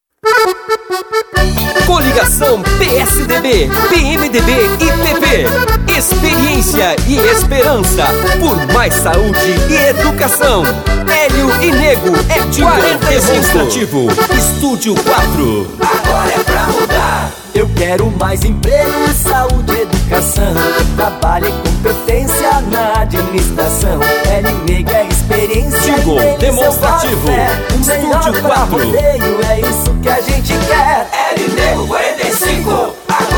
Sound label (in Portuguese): jingle parodia; Jingle Politico 2020; quel mazzolin; prefeito